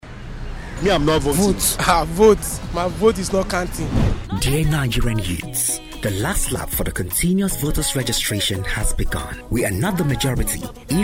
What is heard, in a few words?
Jingle